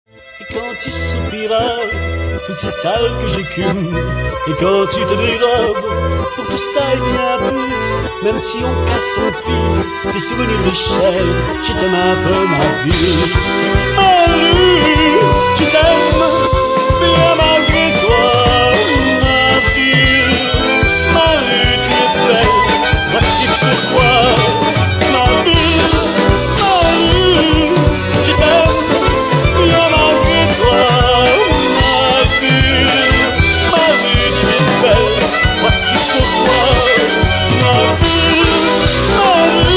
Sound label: orchestr